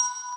Bells

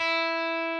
Arp.

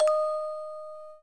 orgel

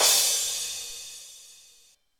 soft hit finish

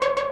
synth; osu

